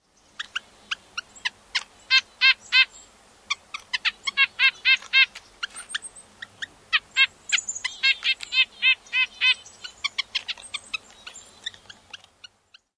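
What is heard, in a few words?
nasal squeaks and toots, Red-breasted Nuthatch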